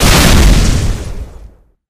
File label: rocket explode, weapons; blackbox; tf